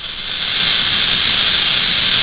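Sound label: RATTLESNAKE; EFFECTS ANIMALS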